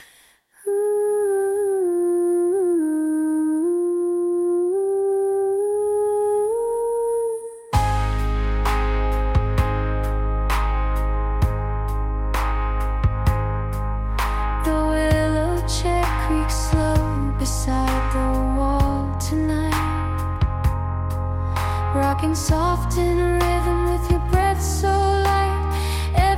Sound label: lullaby, name songs; rhiannon